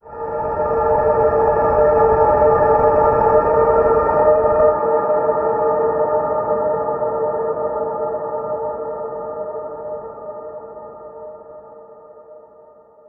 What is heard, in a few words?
drone; ゆめ2っき